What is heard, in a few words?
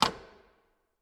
Steel Door Handle; 99Sounds; The Warehouse